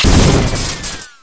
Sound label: emp